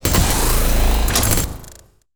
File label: chainsaw extension